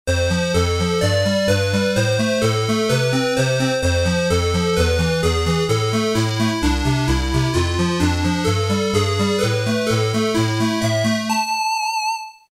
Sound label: Square Tone; Roland M-DC1